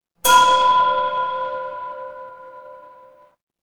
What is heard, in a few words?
instrument bell